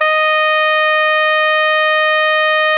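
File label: soprano saxophone D#4, fortissimo; FF; Greytsounds; Philip Wolfe David Crozier's Saxophones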